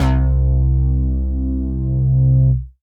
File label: Synth Prophet Bass C; Electronisounds